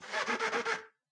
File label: clean